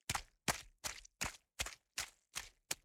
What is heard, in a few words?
Run Mud